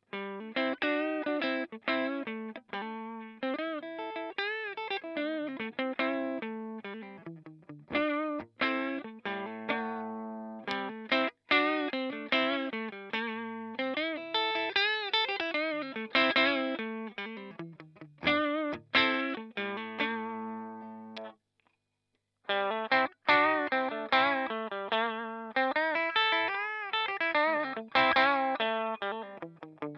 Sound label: clean lead LP